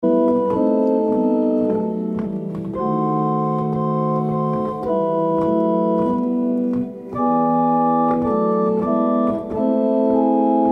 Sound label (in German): Herzliebster Jesu was hast du verbrochen Choralvorspiel; eg 81